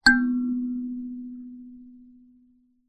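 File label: musical kalimba tine pluck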